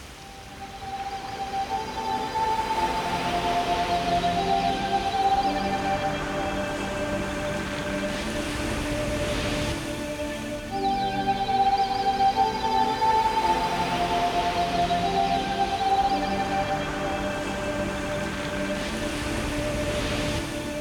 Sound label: oceanic breeze